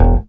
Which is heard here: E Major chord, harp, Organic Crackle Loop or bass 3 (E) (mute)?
bass 3 (E) (mute)